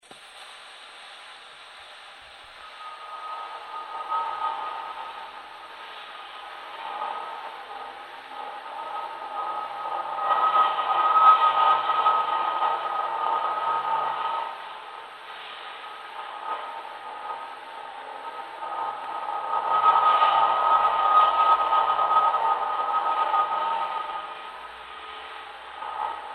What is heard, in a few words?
Gramophone playback; Mould DB3 resin copy No2; HMV 157